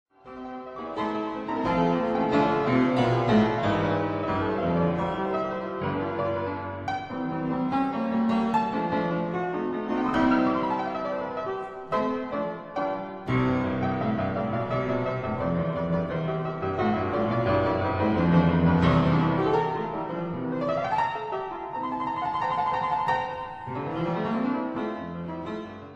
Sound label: Keyboard Sonata in F major, Rondo Vivace; Op. 74